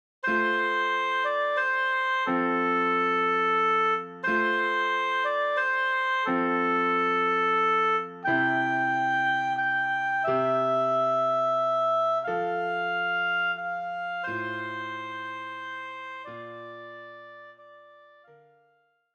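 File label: Silent Night, Oboe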